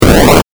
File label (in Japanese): 8bit ゴリラ